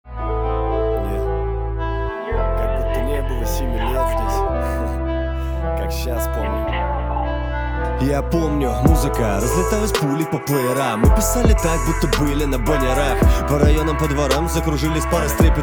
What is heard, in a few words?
jazz